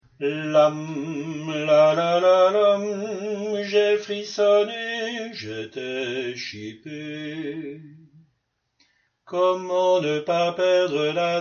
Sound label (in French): hommes; Tenor